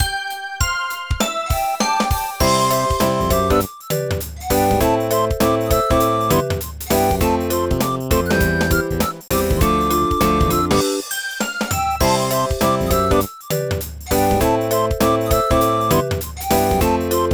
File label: BGM; Sudoku Gridmaster Puzzle Series Vol. 3; NDS